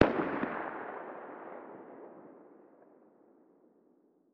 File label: lr300 attack far distant; Rust